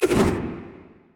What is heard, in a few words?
basic spin; Smite; Cabrakan